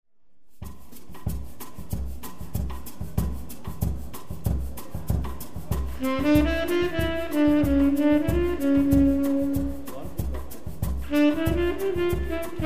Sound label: Trio plus Sax Bossa